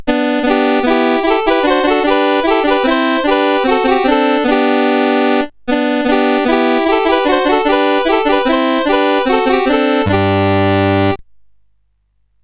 menus, sid CSG8580R5; Games Summer Edition

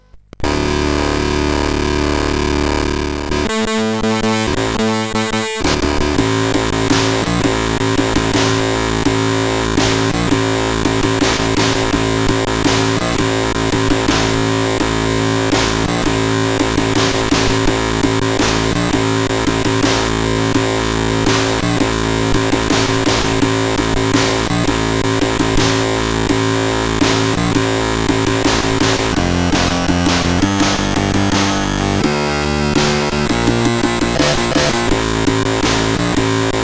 White Noise, sid CSG8580R5

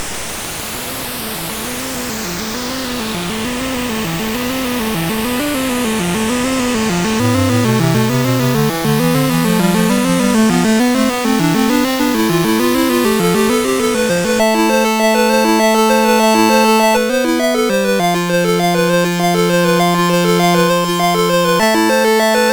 Ocean, MOS6581R4; sid; Master Composer

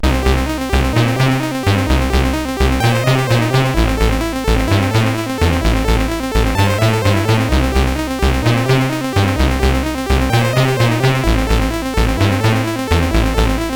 tape version CSG8580R5; sid

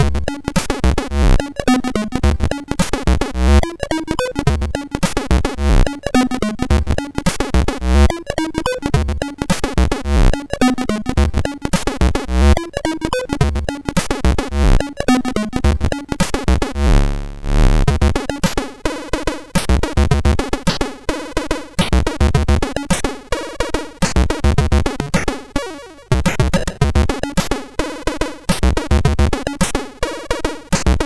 MOS6581R2, sid

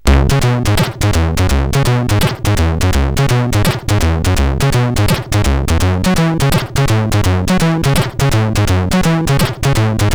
sid CSG8580R5; Galencia

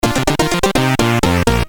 Jingles, CSG8580; CSG8580R5; hvsc

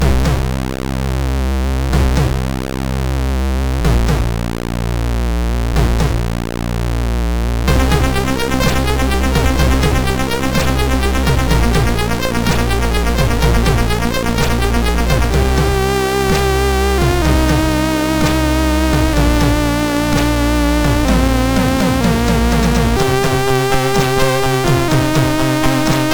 tune, sid MOS 6581 R3

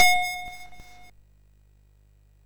SFX, MOS6581; Narco Police

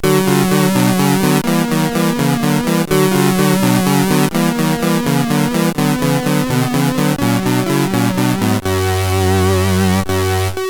sid CSG8580; HVSC; Allegro In C

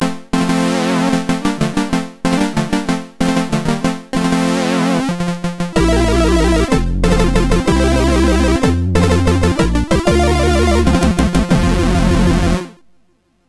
Fanfare, MOS6581R4; sid; hvsc

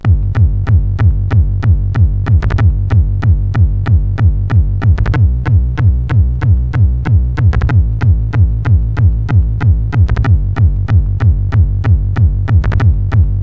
Headbang sid CSG8580R5

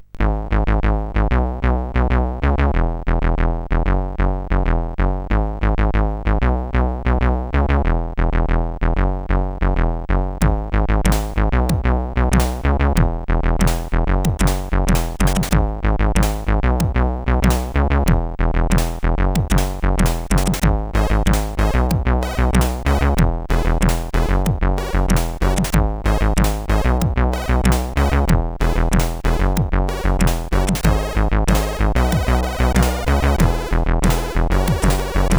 Intro, sid CSG8580R5

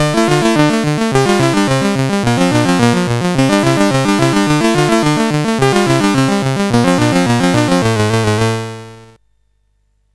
Laserwheel sid CSG8580R5

